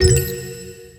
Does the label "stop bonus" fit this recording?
Yes